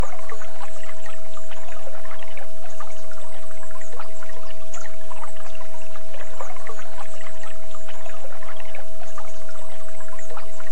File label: Relationships and connections with stream sounds